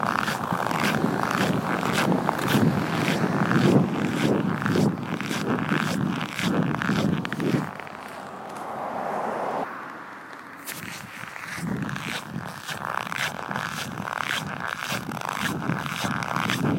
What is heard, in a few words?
Crunching Snow